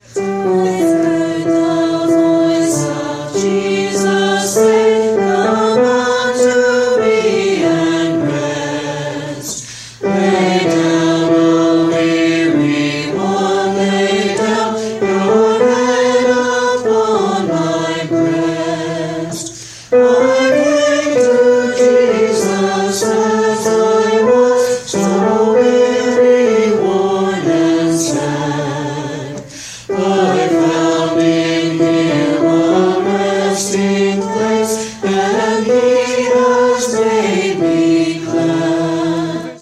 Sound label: soprano; 724 I Heard the Voice of Jesus Say; Gather Hymnal